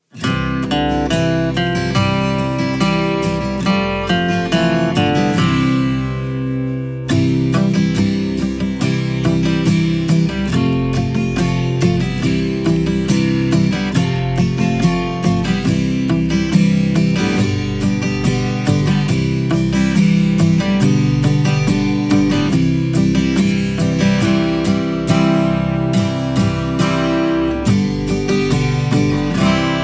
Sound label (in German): haeschen in der grube karaoke; mini spatzenpost; Schuelerzeitschriften